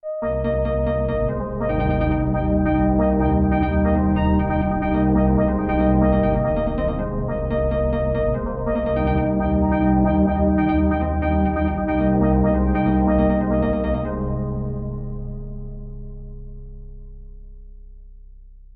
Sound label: Sensory Percussion Harmonic; Sunhouse